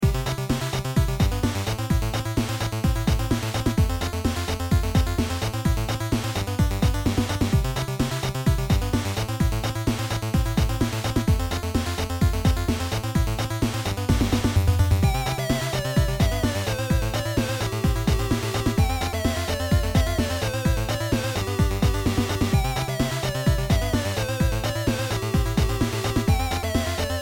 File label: beat